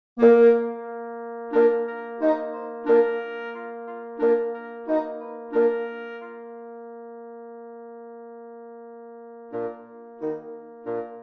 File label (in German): Fagott